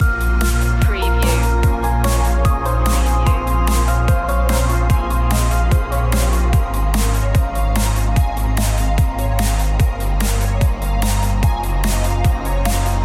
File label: biodigital future loop